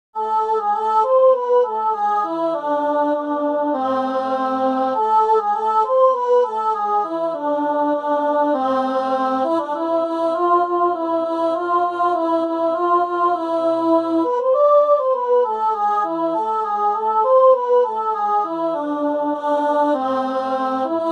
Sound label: Alto